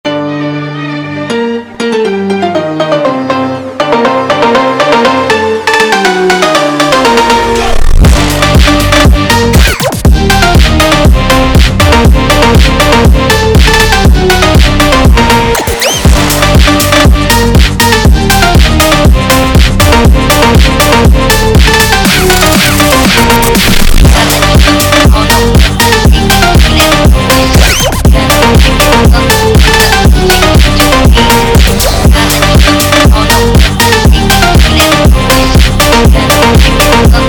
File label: classical symphony phonk